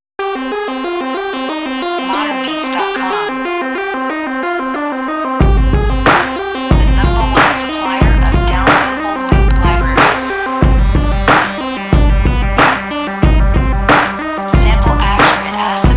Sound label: Xtreme Synth Loops, synth loops